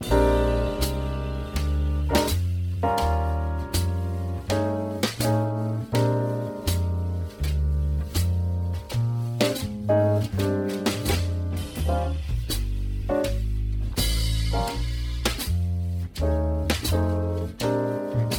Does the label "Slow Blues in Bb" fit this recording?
Yes